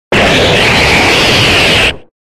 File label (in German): Ruf